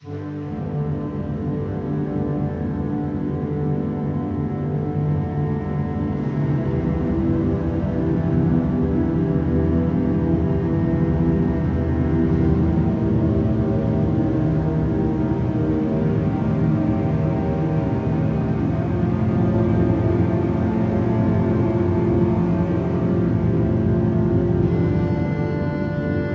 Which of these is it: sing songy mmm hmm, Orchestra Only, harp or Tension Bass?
Orchestra Only